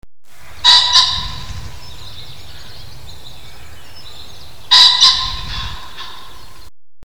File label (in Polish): bażant